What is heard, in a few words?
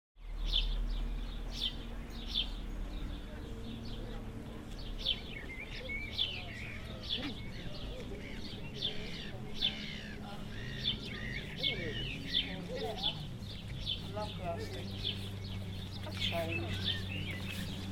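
Near old boat - people and aircraft; Near old boat - people and aircrart; whitstable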